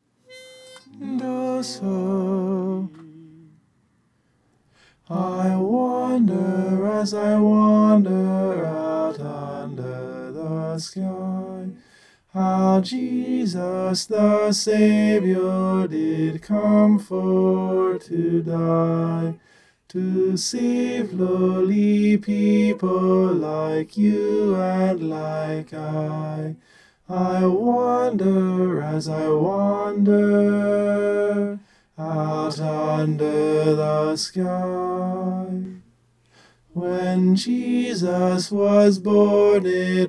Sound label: tenor